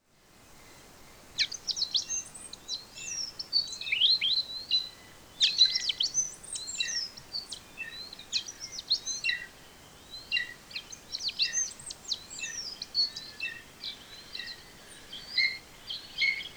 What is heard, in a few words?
Violeteared Waxbill; eBird